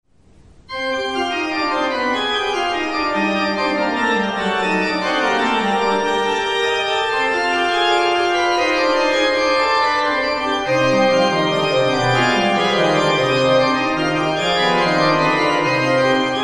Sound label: Fuga organ; Wilhelm Friedemann Bach; Fuga no. 2